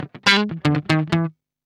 GUI, licks; 120 LICKS

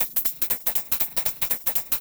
120BPM SWING; Bolder Sounds - Granular

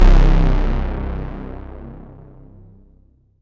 C2, synth; 90 s Sample CDs; NiceBeats; SynthLine VOL-23

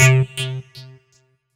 C3, SynthLine; 90 s Sample CDs; NiceBeats; SynthLine VOL-15

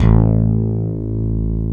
D10, Synth Bass, LA Syn Bass

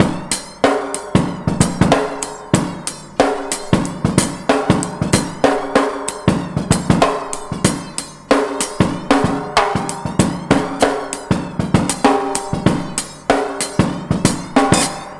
99 bpm, Breaks; ONE HAND; 90 s Sample CDs; Planet of the Breaks

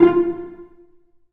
Cel Pz F1; Digital Sound Factory Virtuoso

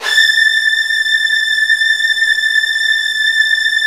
A4, Virtuoso Strings; ILIO; Kirk Hunter Virtuoso Strings VOL-1

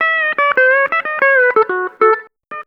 COUNTRY

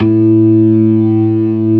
ORGAN, NO PERC

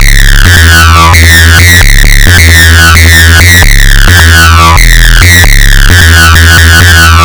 Synth Distorted; Electrocution

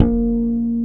A3, mix bass

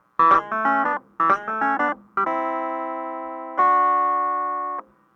clavinet, analog funk; Mixed Berry Jam; Sonic Foundry (Sony Creative Software) - What it Is! 70s Analog Funk

